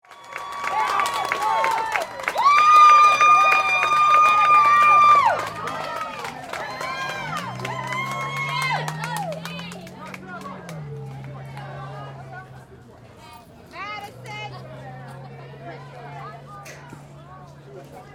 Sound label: Baseball Game, Sports, Little, League, Crowd, Cheer, Ambience; Blast Wave